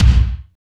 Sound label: KICK, Tekno Industrial; East West